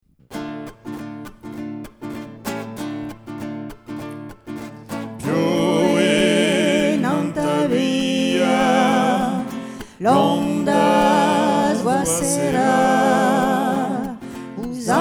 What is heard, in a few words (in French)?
Campanero 3 voix